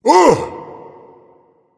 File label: surprised, voice; Smite; ymir skin6